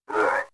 Big damaged; RedDot

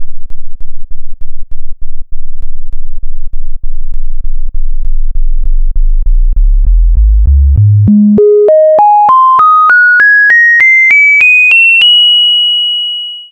Harmonics

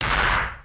Punch; RedDot

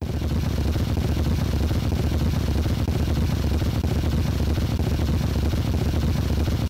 Motorbike; RedDot

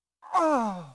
punched; RedDot

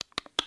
recharge; king; RedDot

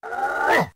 charge Attack; RedDot

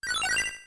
High score; RedDot

